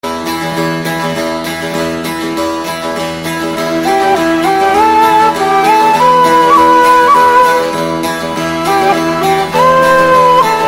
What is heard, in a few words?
Dombra Saz ve Ney